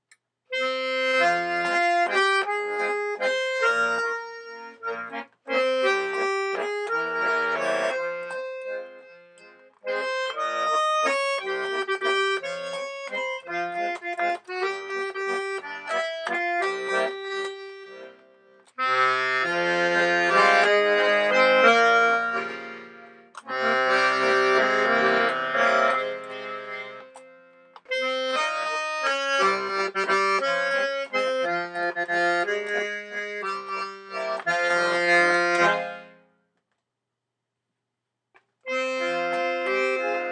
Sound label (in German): DALLAPE finnisch